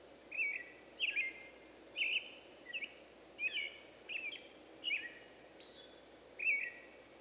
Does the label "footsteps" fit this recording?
No